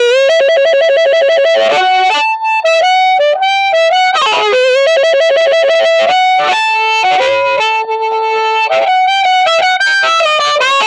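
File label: Blues Harmonica Solo; B Sect Solo; Digidesign; Pro Tools Essential Loops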